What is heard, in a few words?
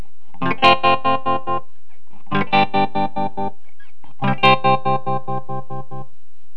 Trem; TremBear